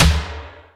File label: FX snare; E-MU Formula 4000 Series Vol. 2; OrbitPercMaster